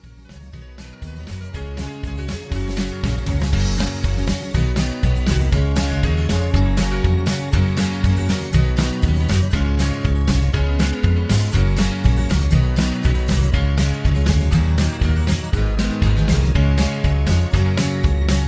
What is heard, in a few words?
Instrumental Lower Key